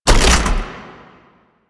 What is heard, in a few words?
Hack Minigame Mech Action A